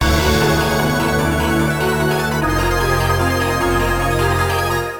BGM STAGE BATTLE; Phantasy Star Zero

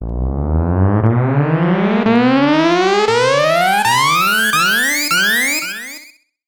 Escape Teleport; Ninja Gaiden Trilogy; snes

